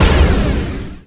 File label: power fist; Fly For Insanity